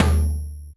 Tom Hard

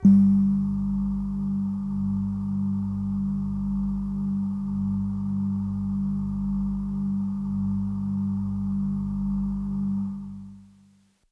Bell Air Kawaii G-1

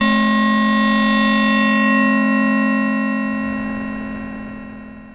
SYNTH

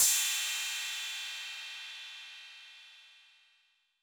Cym B 808 Sat Decay B, Cymbal, Multi Saturated

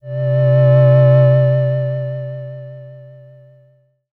SYNTH Romantic Moog

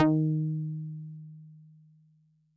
SolidBass DX100 Ds4

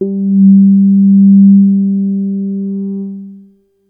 bass G2

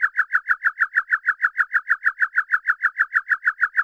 Chirp FX DX100 B2; birds

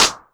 Clap; TRX

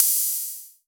HAT

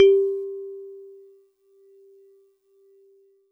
Celeste DX100 G-1; Pristine Celeste

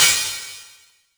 Ride Cymbal

